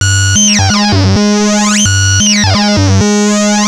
Analoop, Industrial

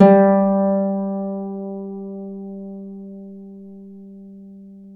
Celtic Harp

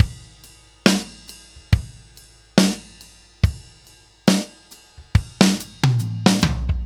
Ballad Loop, drum; Laid Back; 70's Ballad; Sonic Foundry (Sony Creative Software); Loops & Samples Drum Tools

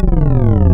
Supa Phaser, wavetables; Animoog

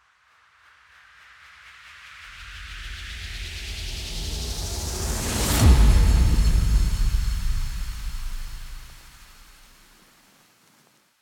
Transition

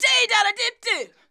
"DIDADA", SHOUTS; Best Service; Hyper Dance

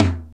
RX7 NORM TM; Crypton - DrumBase5000

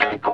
WAH SNIP, Funk Gtr & Wah

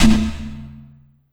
PERC, Drum & Bass, 170 BPM

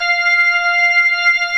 ROCK ORGAN

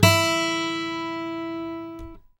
GUI AC.; Sound & Vision; Gigapack I CD 2; GUI ACOUSTIC 1

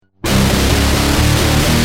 Guitar, Industrial

